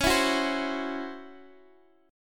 Db Minor Major 7th Double Flat 5th Db down Guitar Open D